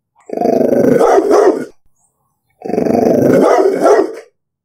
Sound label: dog zombie barking